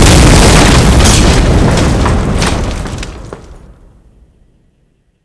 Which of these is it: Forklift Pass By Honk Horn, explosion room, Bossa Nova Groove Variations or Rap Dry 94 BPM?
explosion room